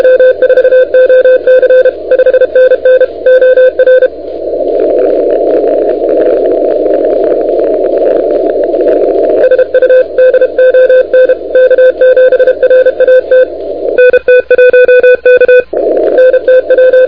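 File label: continuous wave 28MHz; ARRL10M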